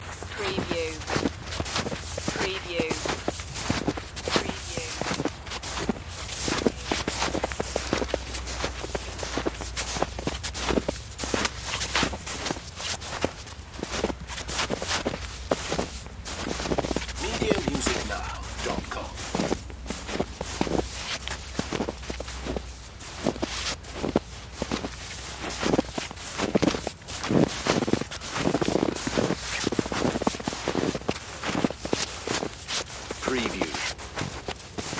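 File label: Footsteps Snow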